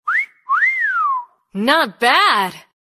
Whistle Ringtone; Pubg

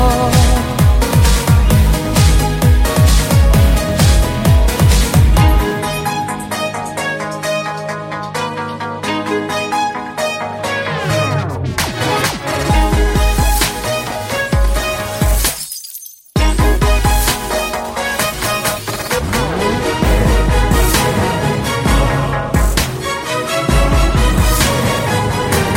Ringtone for Mobile Phone; ringtones

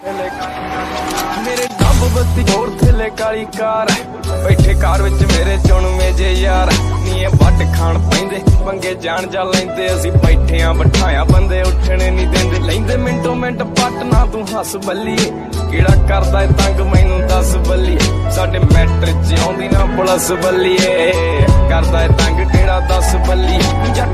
New Punjabi Song Ringtone

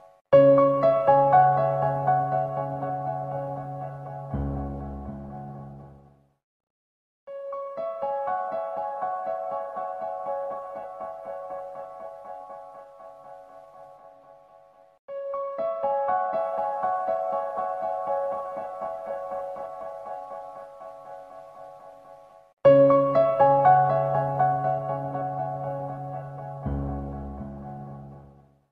Soft Alarm Tone Ringtone For Mobile; ringtones

